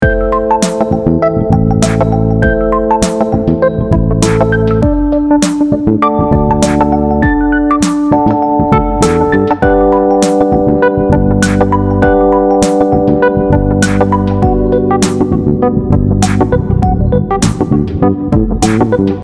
251 G major, ii–V–I chord progression in G major (Ami7–D7–G); bass; chord substitutions